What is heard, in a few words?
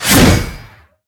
swing thrust